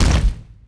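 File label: pot hit stone medium; objects; Star Wars Obi-Wan; XBOX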